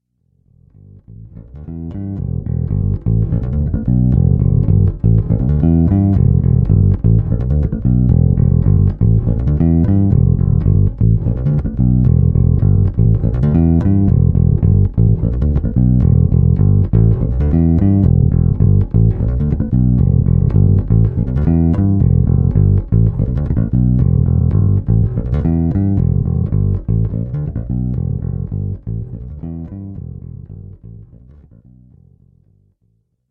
danelectro dead on58 longhorn both pu fingerstyle, bass; stegtonblende null